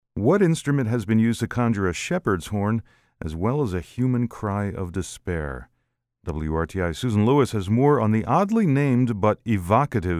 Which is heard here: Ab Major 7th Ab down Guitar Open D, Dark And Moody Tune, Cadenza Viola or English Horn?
English Horn